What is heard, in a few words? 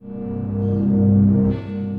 Ominous Pads; Labyrinth